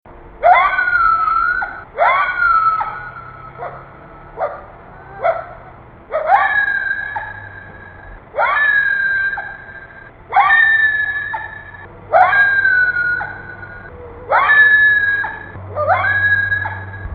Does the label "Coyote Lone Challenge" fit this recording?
Yes